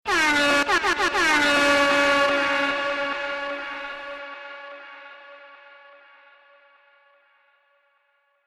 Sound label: air horn dj mix